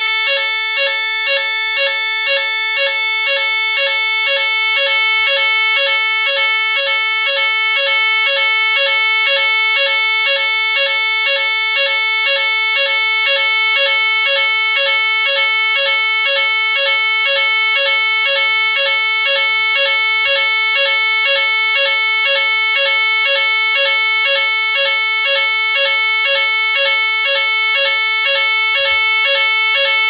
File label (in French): Alerte incendie